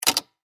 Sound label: Technology Cassette Player Stop End Of Tape; SFX Bible